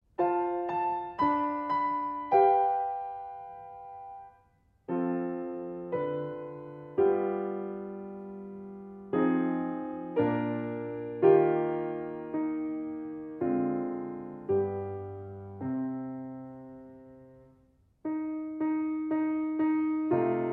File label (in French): piano seul; projet vox les rameaux